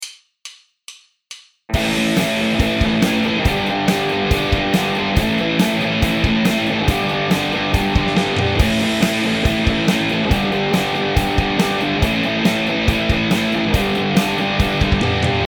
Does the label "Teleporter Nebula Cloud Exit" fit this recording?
No